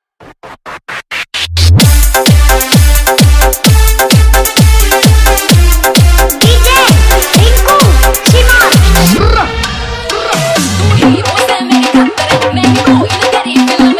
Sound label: Remix Song